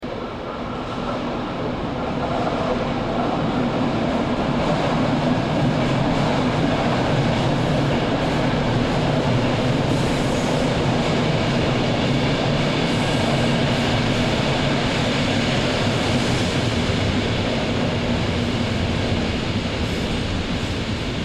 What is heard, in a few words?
Train Wagons